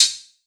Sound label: Op Hat